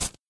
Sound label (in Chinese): 抽纸音效